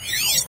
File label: Focus Sound